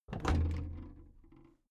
big plastic case lid lift soft

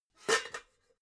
pots clank soft

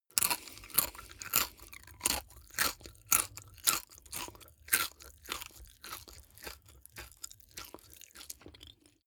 eat crisp mouth open

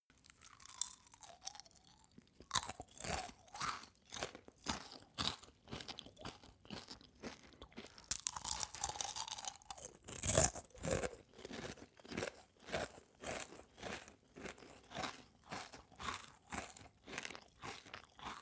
male eat cereals full

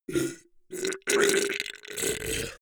beast choke deadly

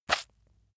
leather impact mud medium squeeze

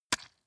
leather impact mud hard squelch

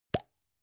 gum plastic box open

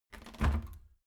washing machine tumble drier door open

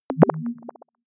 bubble approve

